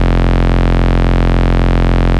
Root F, Bass; Vengeance Samples; Vengeance Ultimate Bass

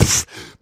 Beatbox Snare, Human Beatbox; Vengeance Samples; Special Sounds

